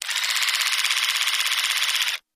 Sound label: Motor, Small; Electric Toothbrush, Fast Constant, Stop